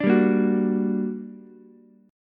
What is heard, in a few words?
G Minor Major 7th G, Ukulele; strummed; JGuitar